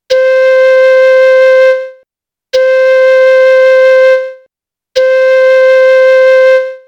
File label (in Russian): 2 oktava 1 do flejta